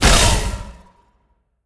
projectile; smite; god tiamat

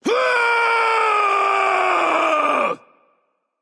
battle cry; smite

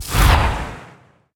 cast arcane; smite; god merlin

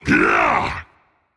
grunt attack rage; chaac

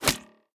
roman ranged bow impact; smite; npc; roman archer

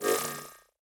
Hover; smite; god cupid skin13

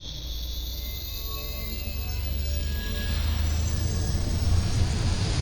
Timer; smite; horus

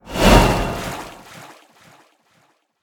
waterball trident swing; smite; poseidon

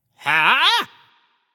confused; smite; xingtian

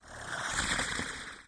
alien lobby landing; smite; serqet

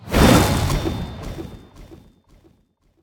waterball trident swing; smite; poseidon

